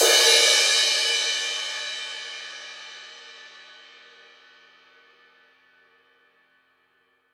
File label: Crash Roomy, Cymbal, Drums; One Shots; Ableton Live 12 Lite; Core Library